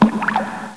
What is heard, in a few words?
SEWER; Renaissance